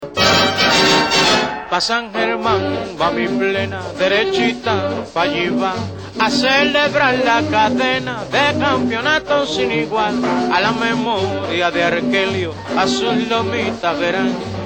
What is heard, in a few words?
Plena Ring Tone